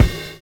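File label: RAPID FILL; Univers Sons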